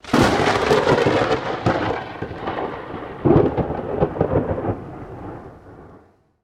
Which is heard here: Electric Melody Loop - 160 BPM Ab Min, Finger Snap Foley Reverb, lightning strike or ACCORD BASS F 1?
lightning strike